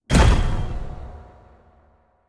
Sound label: giant fire impact; smite; vamana